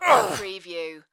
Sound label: Male Grunt, Human Injury, Pain, Vocal Ugh, Ouch